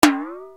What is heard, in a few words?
PER, EMU SP1200; 300 Drum Machines